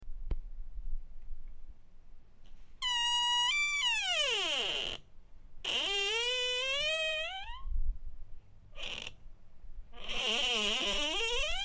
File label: Door creaking; Sound FX